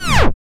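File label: FX record, FX perc; Northstar - Drumscapes Roland; Bonus Perc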